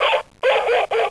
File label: dog; BUDZIK METALOWY Z GLOSEM PIESEK